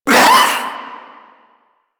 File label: Proto Infested vocal short attack